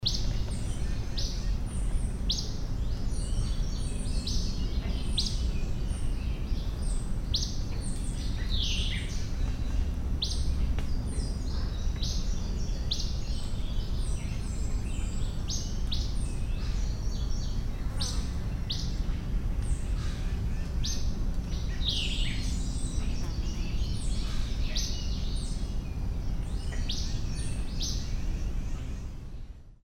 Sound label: Acadian Flycatcher; Caroline MD